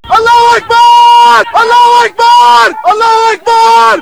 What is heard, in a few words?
boom; jihad; cstrike